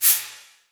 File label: cabasa; Percussion; Sonatina Symphonic Orchestra